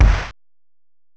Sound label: SNARE, DRUMS